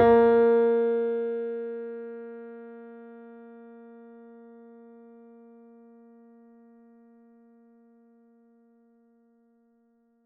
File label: mini grand; bananenkeyboard